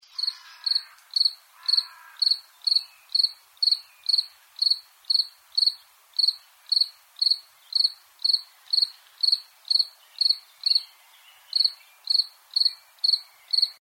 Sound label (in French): grillon